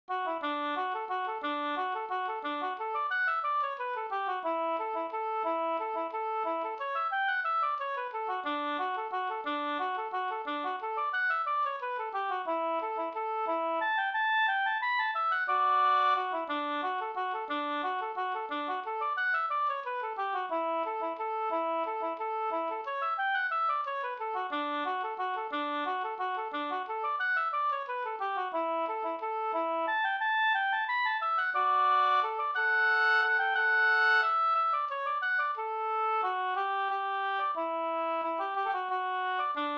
vals

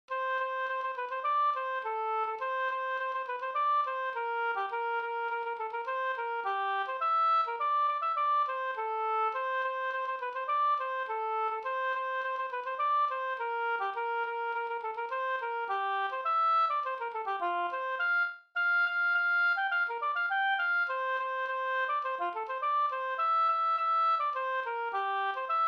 snoa

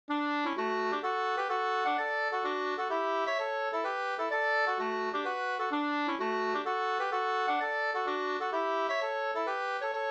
polska; e Hadar Larsson Sd

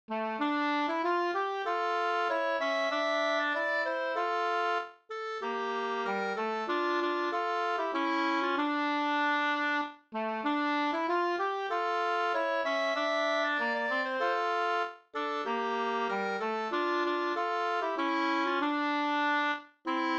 vismelodi; Ack Varmeland

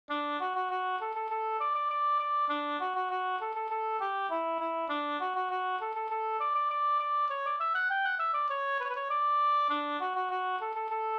engelska

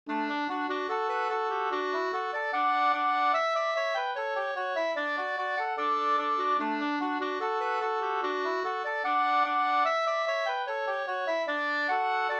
schottis; Toivios schottis Oravais fi 2st

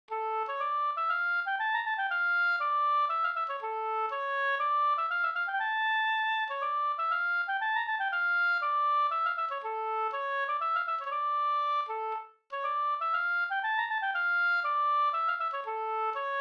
polska Bingsjopolska; e Pekkos Olle e Pekkos Helmer

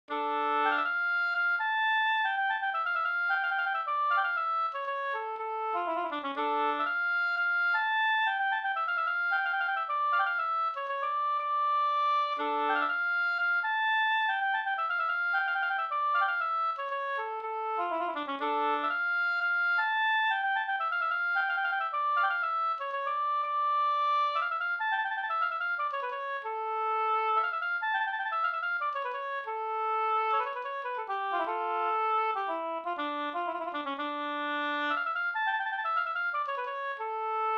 polska Bodapolska

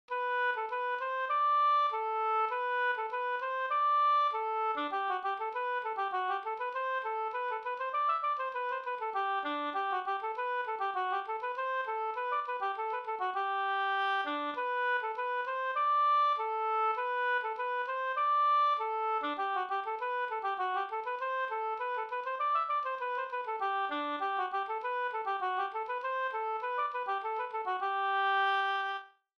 skanklat; Anders Andersson Lastringe

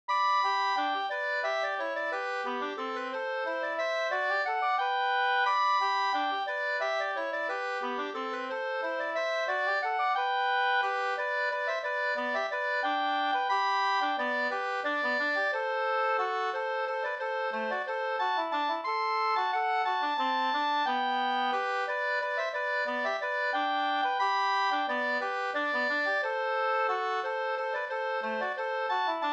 folkdans Schottiskadrilj

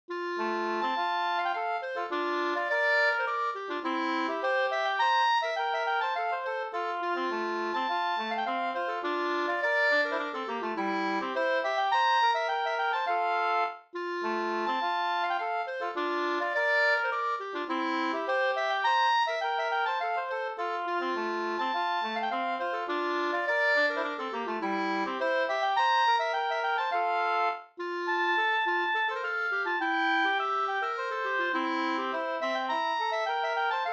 polska; PJ Andersson Hissjo Floda Sd

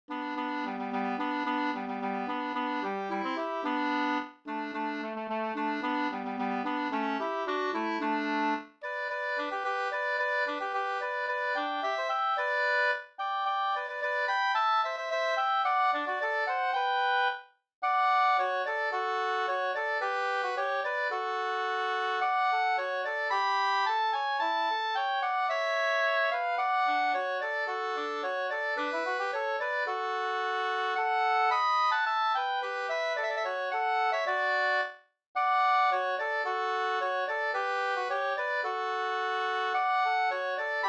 folkdans Sonderborgskadrilj Gdur

